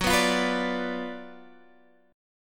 Gb Major 7th Suspended 4th Sharp 5th, down, Guitar, Standard; strummed; JGuitar